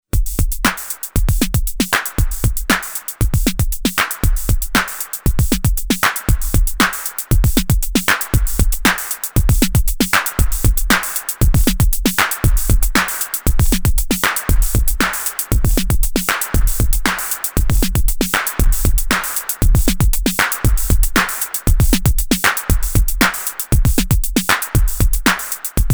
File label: IKM Uno Drum Compressor